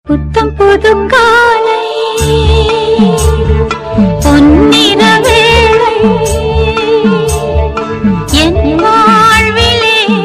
tamil morning; ringtone

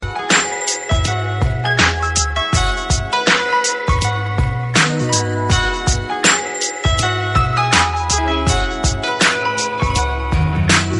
instrumental

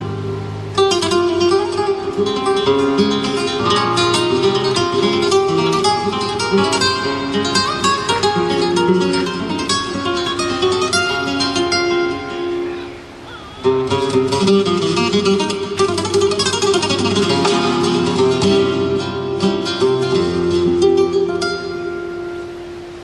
guitar

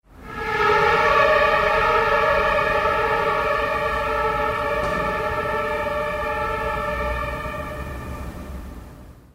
german emergency

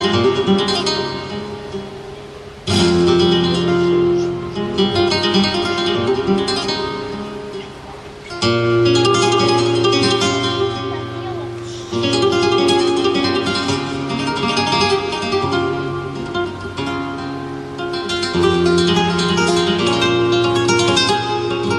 most beautiful guitar; ringtone

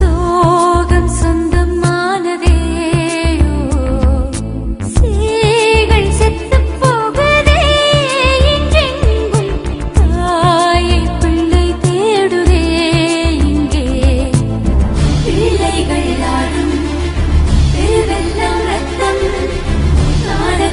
sweet tamil iraqi fusion; ringtone

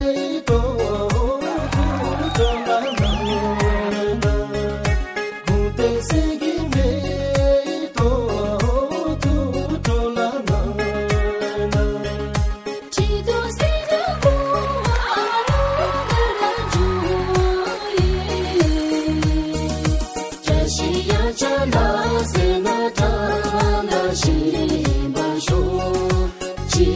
bhutanese song ringtone

